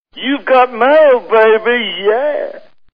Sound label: Is Calling Rap, ringtones